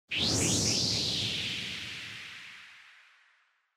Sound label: Motion Speed Lines High Short